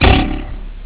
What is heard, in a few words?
out of ammo; openrsc